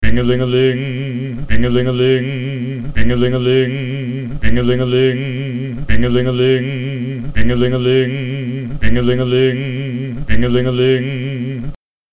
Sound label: ringaling